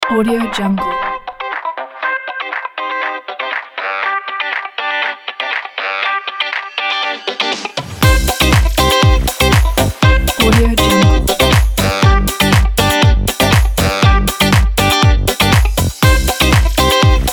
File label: fun summer dance guitar